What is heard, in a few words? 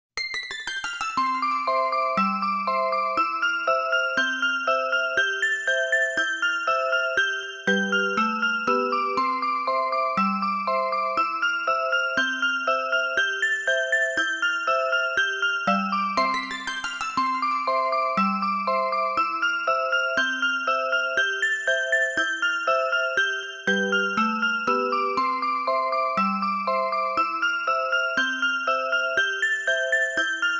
Organ Ver.; Gekkou no Carnevale; Faiba